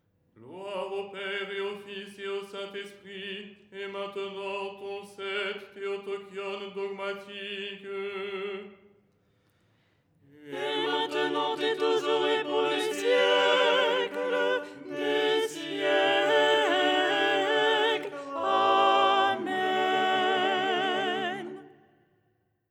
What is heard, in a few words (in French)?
soprano; " Gloire… et maintenant… "; Les 8 tons en français